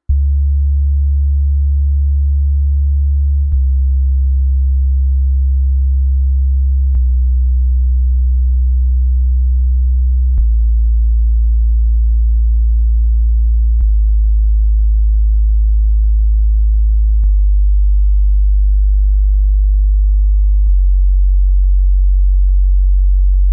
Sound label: Subwoofer Full Spectrum